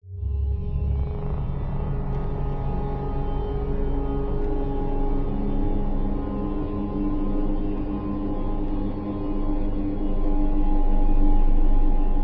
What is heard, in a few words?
horror atmos drone tense sinister slowly descending; zapsplat